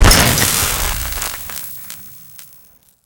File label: explosion grenade emp high